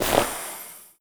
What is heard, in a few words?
gasmask on, foley